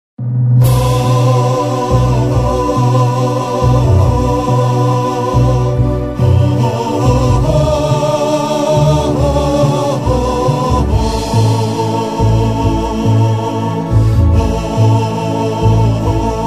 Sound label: Vocal Shoor; Vocal&Effect